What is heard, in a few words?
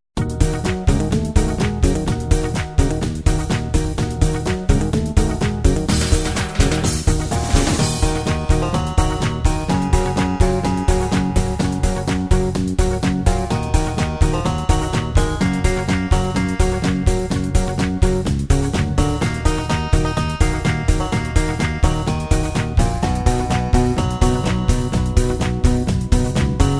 music